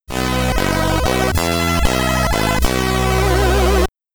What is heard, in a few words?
Stage Clear (SID Stereo), commodore 64; Edd the Duck